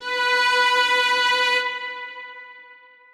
Strings kiai

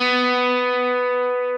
Arp.

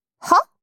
combo break

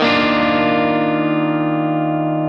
x 5 6 7 5 Dmaj9 Arpeggio down

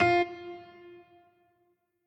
piano riff intro

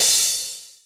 Cymbal G

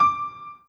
Piano Chord

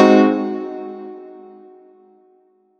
soft hit whistle

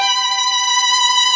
violin; osu

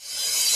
reverse

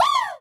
woo

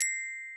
gloken

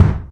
drum kick

